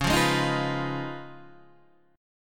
Db 9th Db down Guitar Standard; strummed; JGuitar

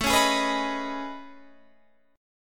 A Major Sharp 11th, Guitar, Half Step Down

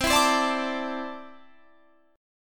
C 6th Add 9th C down Guitar Standard; strummed; JGuitar

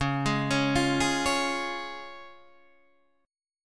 C sharp Major, Guitar, Half Step Down

